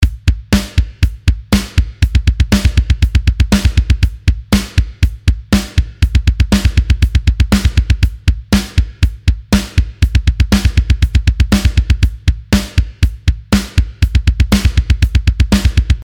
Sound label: Double Bass Beat, drum